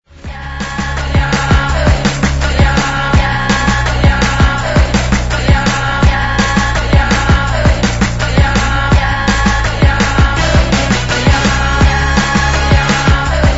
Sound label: atomic drum assembly; unilever